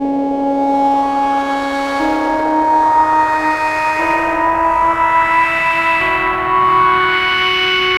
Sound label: Crunchy Pad; Sonic Foundry (Sony Creative Software); The Electronic Music Manuscript Collection